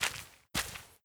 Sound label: mage walk